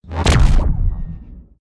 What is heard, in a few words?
klingon bird of prey exiting warp